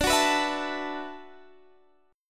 E 7th Suspended 2nd Suspended 4th, chord

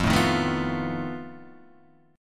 F 7th Flat 5th F down

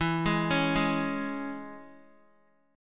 E 6th, down, Guitar; arpeggiated; JGuitar

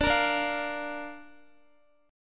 D Minor Sharp 5th, D, down, Guitar; strummed; 4F,4A,5C,5G,5C,6E; Dm#5

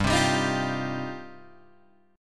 G 7th Suspended 4th Sharp 5th, Guitar Open G

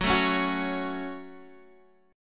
Db Suspended 4th Sharp 5th

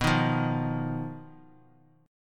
B-Major Flat 5th B down, Bass Standard; strummed; JGuitar

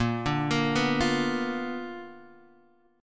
B Minor Major 7th Flat 5th, chord

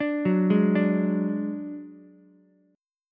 F sharp Suspended 2nd Sharp 5th, Ukulele; arpeggiated; JGuitar

